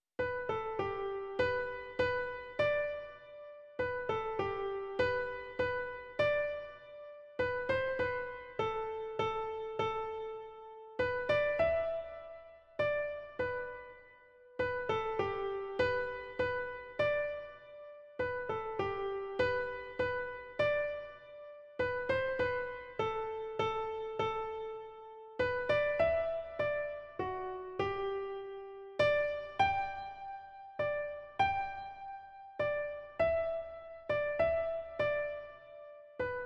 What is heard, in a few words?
tombigbee waltz